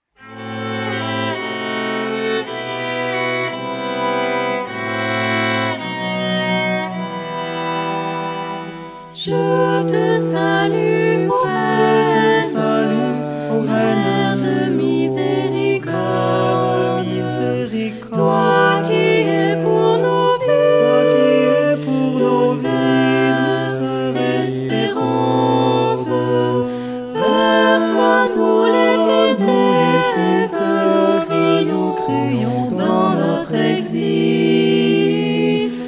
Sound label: A Capella; Je Te Salue O Reine; Chants A Marie